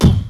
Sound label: RY-10, DRUMS + PERC, YAMAHA; Rubber Chicken Software Sample Bank